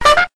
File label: Doot Doot notification